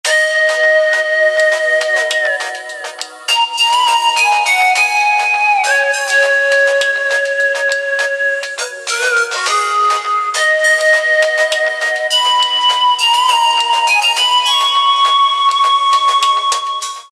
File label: Folk song; ZTE Z222-AT&T